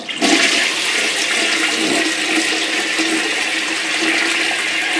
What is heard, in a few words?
toilet Flush